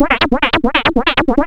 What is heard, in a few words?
C#, Synclav FM, FX; Big Fish Audio